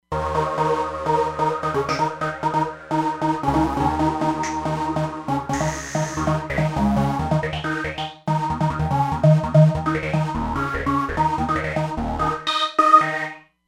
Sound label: Roland Fantom XR; Xtatic